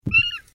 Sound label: Meow